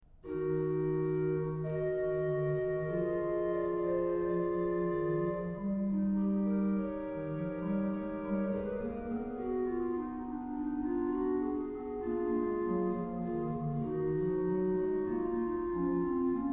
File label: Praeludium et Fuga in d; organ; BWV0539